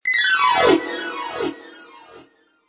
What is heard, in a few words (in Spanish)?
piano todas teclas; tono de llamada